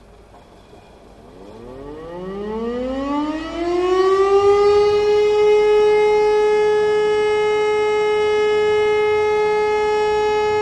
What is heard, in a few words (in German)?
Entwarnung